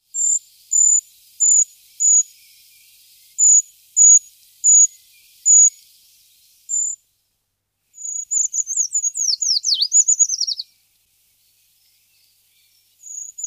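obyknovennaya pischuha golos, common treecreeper voice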